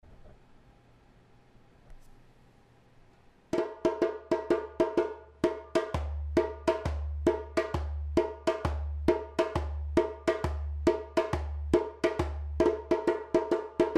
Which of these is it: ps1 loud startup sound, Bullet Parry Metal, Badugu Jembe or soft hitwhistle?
Badugu Jembe